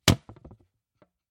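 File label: spear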